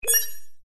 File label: science fiction door code unlock accept